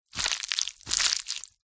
cartoon wet squelch sloppy movement

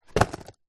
chocolate box empty drop ground

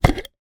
household jar lid remove; zapsplat

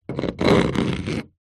foley wood scrape sharp pointed object; zapsplat

